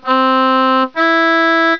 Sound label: interval